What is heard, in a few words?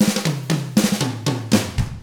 ROCK